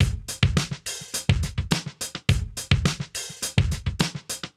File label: Drums Proc 105 bpm, funk soul; sampled funk soul